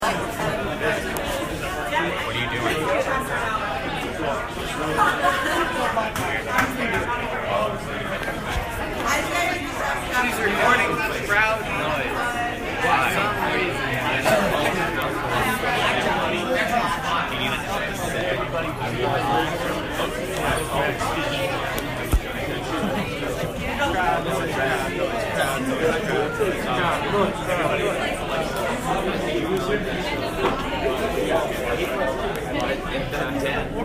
Restaurant, Late night snack with friends in noisy HofUSA.